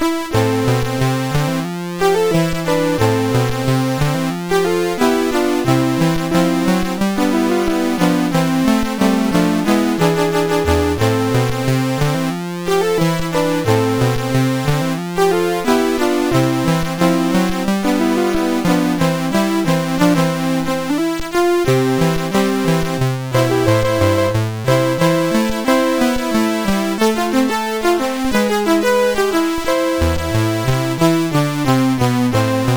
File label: Walk done Run, MOS6581; MOS6581R4